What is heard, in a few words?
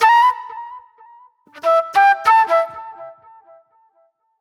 Sharp Delay Flute; Sonic Foundry (Sony Creative Software); Manhattan Flutes; Mixed Berry Jam